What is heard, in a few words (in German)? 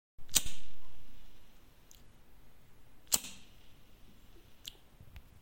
Feuerzeug